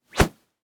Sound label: Whip Whoosh Swoosh; Foley; Sound Effects:Objects